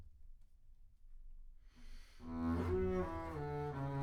Bass Room

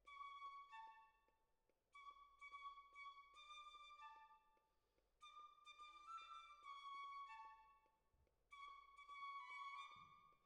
Cellos

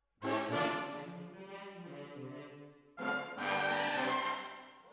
Hall, Jazz for piano

